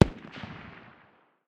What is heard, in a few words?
dmr far distance, 7.62x51mm; tacz-tweak-pack; taczmechanics